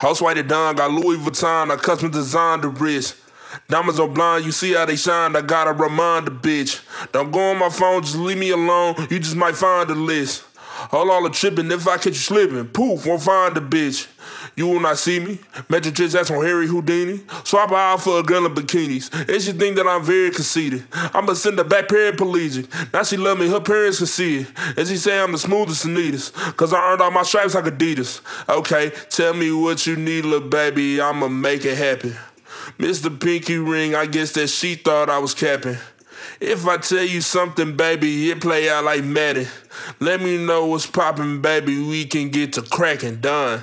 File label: Acapella - 132 BPM D Min Lead (Dry); Vocal Loops; Cymatics; GHOST (Beta Pack); Put It On